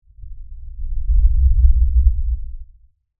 Tremor LFE Rumble, Scifi, Tremor, Lfe, Rumble, Science, Fiction